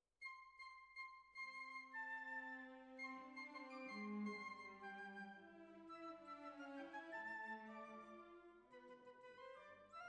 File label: Violins, jazz for piano